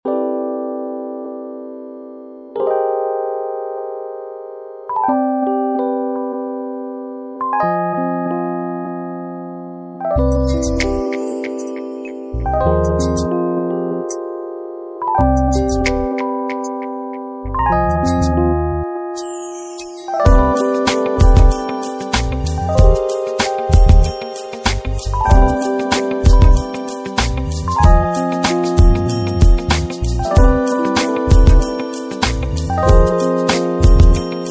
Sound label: smooth jazz